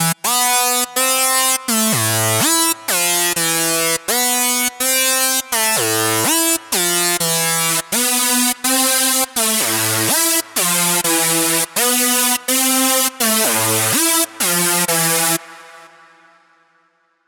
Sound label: Distortion Saw